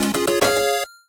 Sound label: win round; milebymile